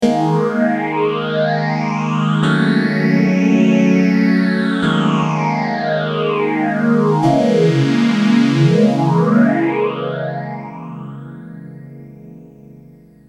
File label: m1000 sph323